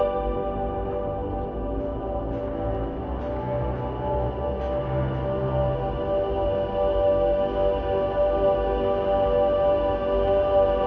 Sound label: Lazily Loop No drums, 85bpm; jenny leclue detectiv